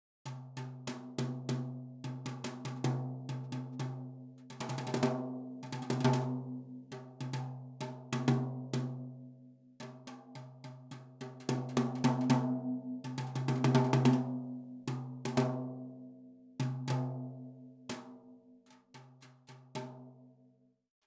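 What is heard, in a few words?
brush 14 in ch bop tom; Brushes on 14 Inch Bop Tom; professionally recorded acoustic drums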